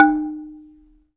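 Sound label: AFRICAN MARIMBA D#4; Heart of Africa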